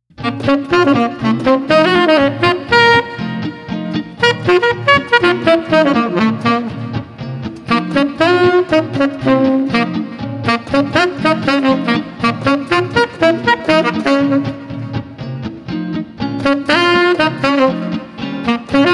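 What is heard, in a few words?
Street Sax Guitar Socialite, 120 bpm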